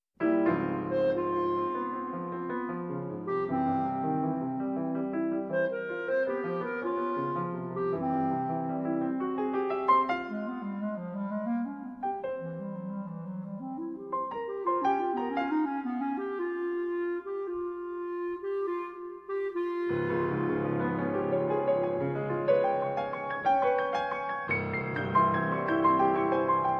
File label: Sonata For Clarinet And Piano, I. Allegro Deciso